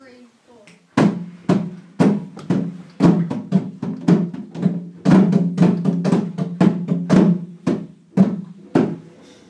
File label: drumming compositions